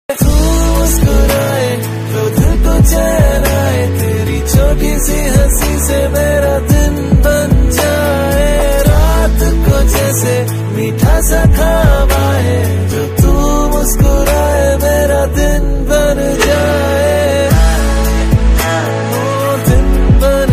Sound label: Ringtone